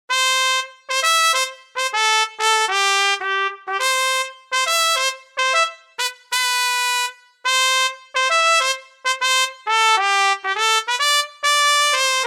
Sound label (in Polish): trabka